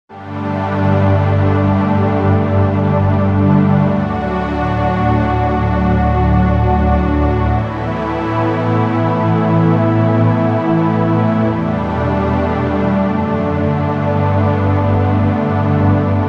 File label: Underscore PAD; Worship Flow